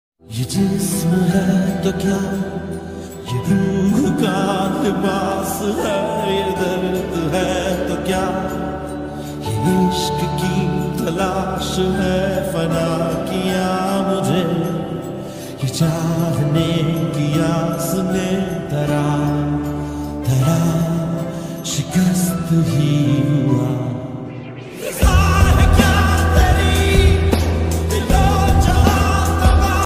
music ringtone, lofi ringtone, Hindi tone

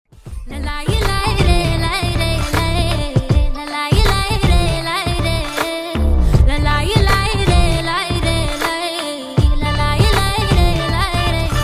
bgm song ringtone chill opera; Soorarai Pottru

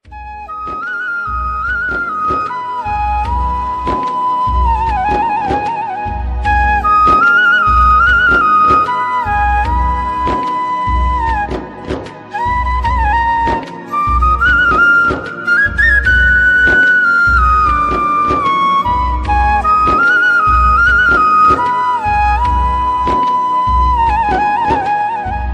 love ringtone, new Hindi ringtone

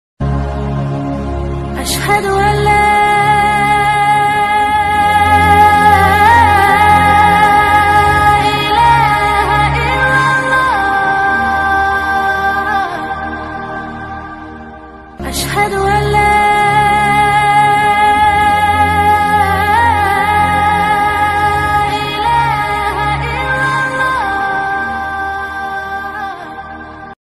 new fajr alarm tone islamic ringtone; fajar alarm tone

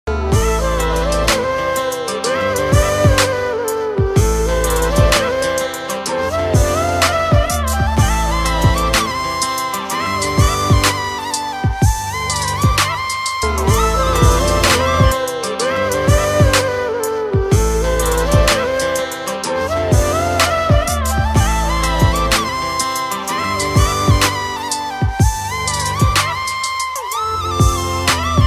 flute bgm ringtones; gujarat kuruthi

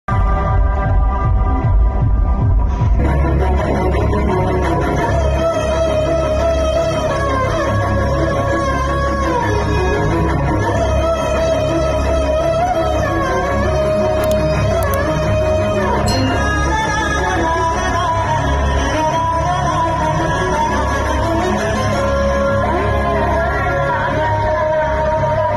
emotional bgm; bichagadu2

